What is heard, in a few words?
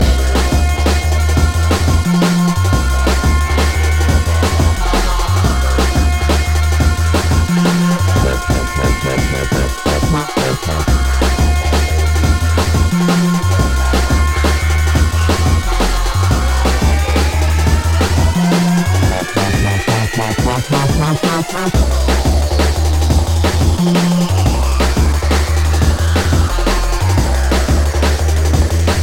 The Horn Track